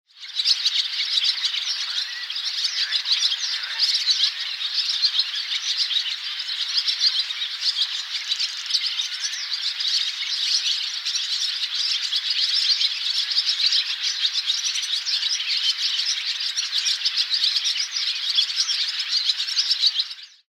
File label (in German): Rauchschwalbe